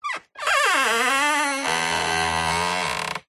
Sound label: Wood Stress Creak Squeaks; DPA4060; pmsfx